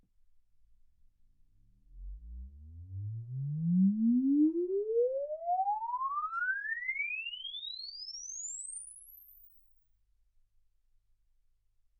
exp swept sinus 10Hz 24kHz

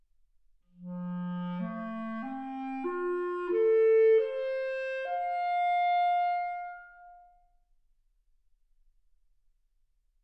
clarinet

